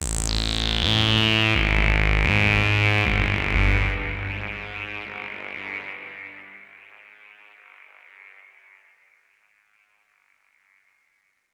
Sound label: Oneshot Synth; zombie army 4 dead war ps4 stadia switch windows xbox one gamerip 2020